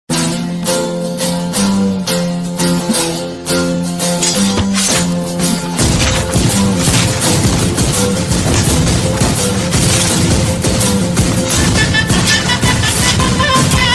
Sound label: climax ringtone; pudhupettai